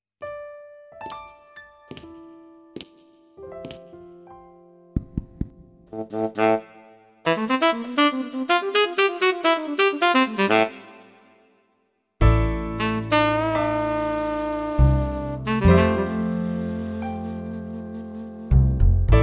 tenor

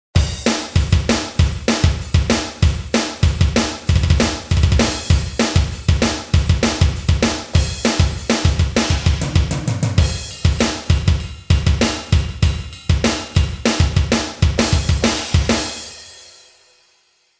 metal, td7

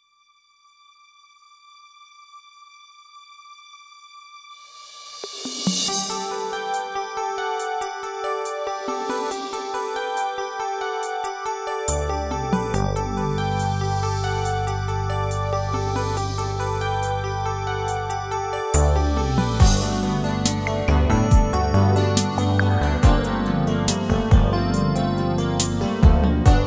ballade

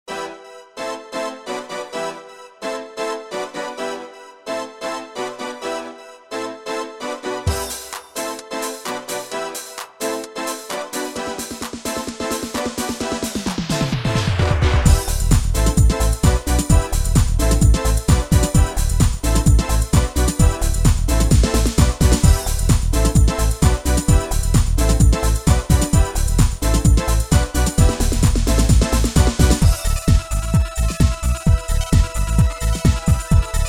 housetec

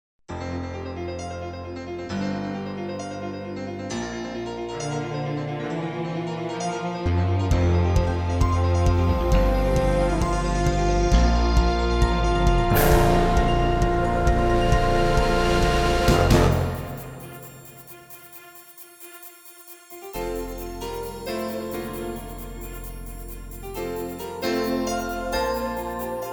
modern orch; Orchestral ROM block demos; KURZWEIL K2500 factory demos; HJ Modern Orch

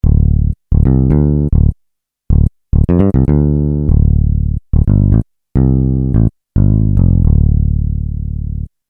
Electric bass

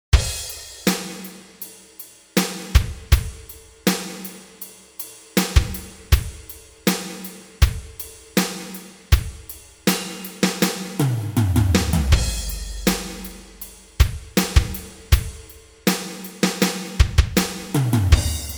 ballad

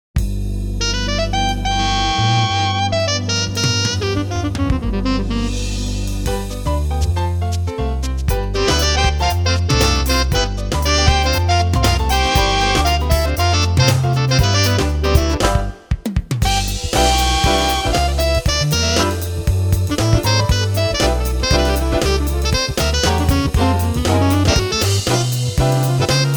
sax squel

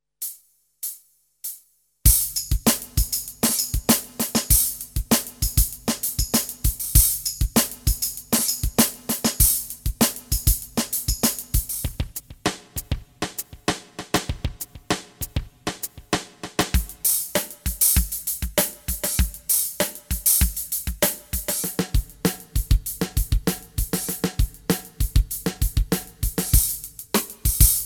drum demo

